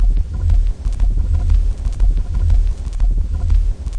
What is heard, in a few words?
120 swingy noise blubs; LOOPING AMBIENCE